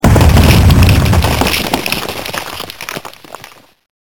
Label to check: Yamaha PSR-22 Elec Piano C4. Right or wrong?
Wrong